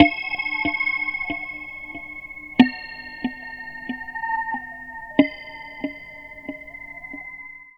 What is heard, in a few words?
Chime; Sonic Foundry (Sony Creative Software); Loop Noir